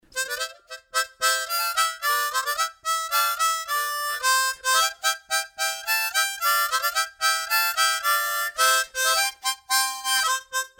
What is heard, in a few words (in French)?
Harmonica Joyeux